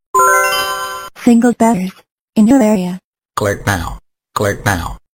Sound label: click